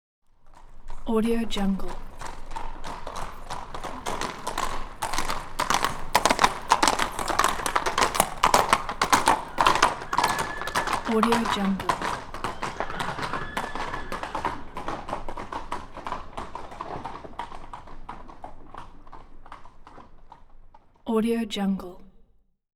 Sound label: two horses gallop by on cobble street with whinnies